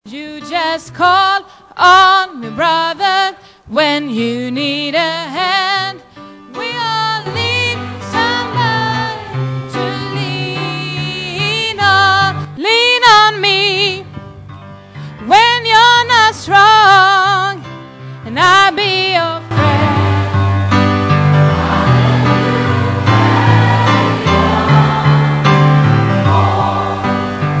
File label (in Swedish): sopran